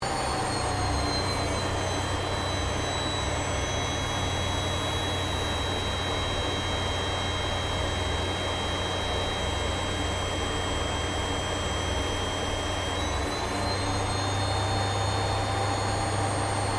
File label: AIRCRAFT CA-27 SABRE FLYING INTERIOR; CA-27/F-86 Sabre; Airplanes; Vehicles